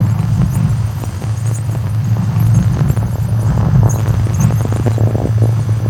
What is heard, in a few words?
Rail Post Lightning; Ninjago